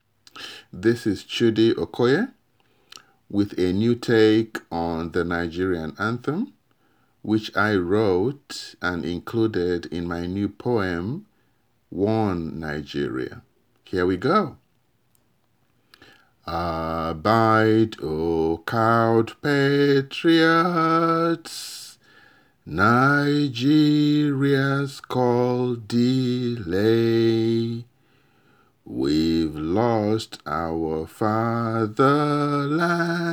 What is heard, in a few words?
Anthem; Worn Nigeria; Awka Times Magazine